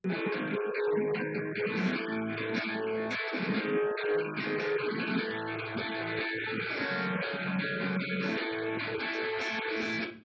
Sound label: wdrums; SiSEC10; underdetermined